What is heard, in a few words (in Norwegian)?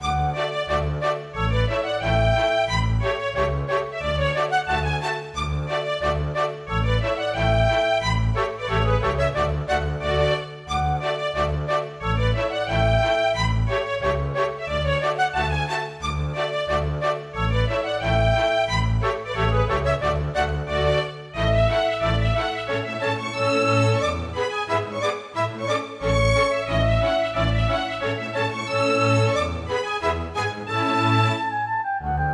Polka; storgårdsmusikk